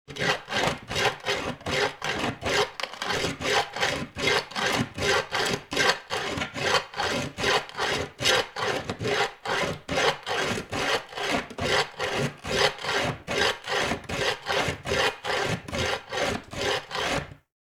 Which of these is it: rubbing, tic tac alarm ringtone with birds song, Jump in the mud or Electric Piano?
rubbing